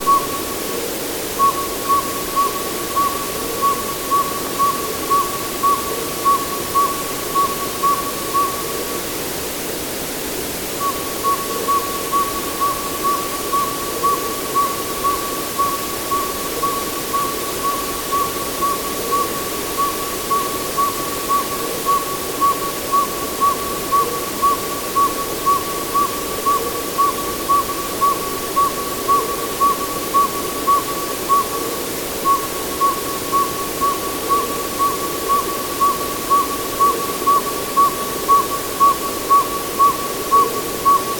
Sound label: Northern Saw-whet Owl, Aegolius acadicus; owls